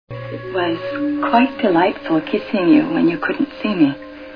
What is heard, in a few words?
kissing